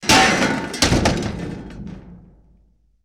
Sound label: Metal Debris Clank